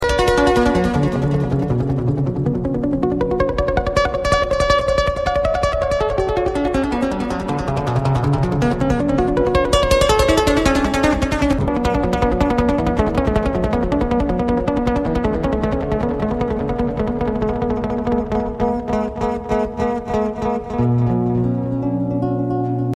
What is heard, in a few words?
3d Music Tone